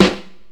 fat steel snare drum sound F sharp key

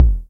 low tom one shot a key; tom drum

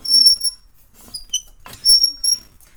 metallic creak

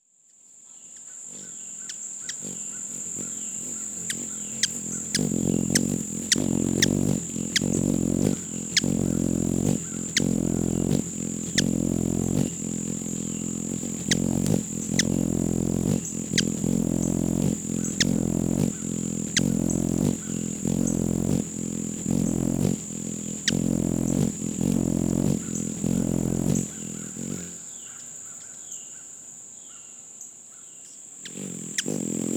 Humming Bird, field recording

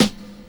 steel snare drum sound g sharp key

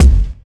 punchy steel kick drum sample C key

